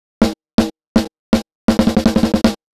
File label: Gate snare; SSL X